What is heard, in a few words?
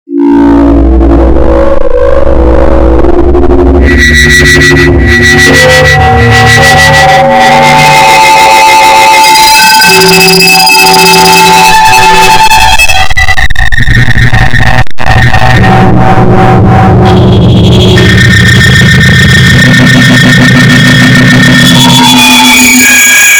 noise